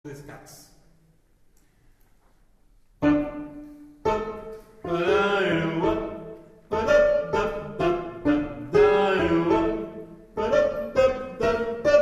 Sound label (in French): Canon des scats